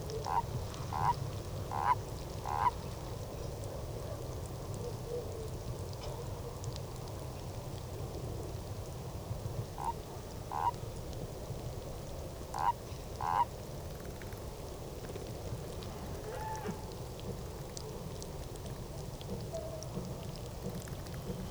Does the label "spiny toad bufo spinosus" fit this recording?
Yes